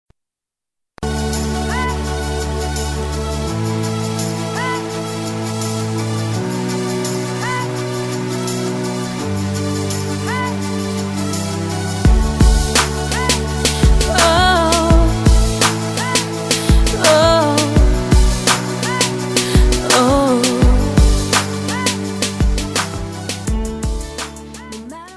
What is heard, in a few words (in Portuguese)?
Aniversário Amigo Feminino